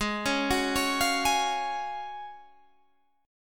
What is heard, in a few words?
F sharp Major 7th Suspended 2nd, G sharp, chord